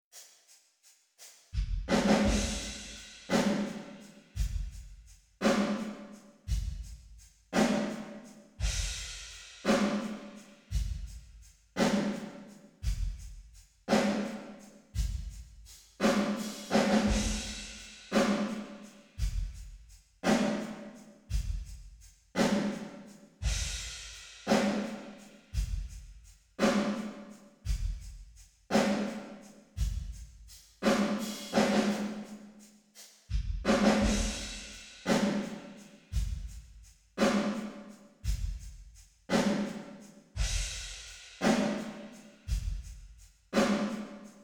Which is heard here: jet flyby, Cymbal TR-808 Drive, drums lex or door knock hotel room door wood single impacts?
drums lex